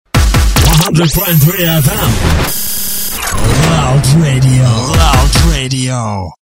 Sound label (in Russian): Джингл; '100,3 FM'